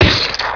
m gibslope; PC Gamer IT CD 41; Daikatana

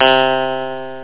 strat guitar

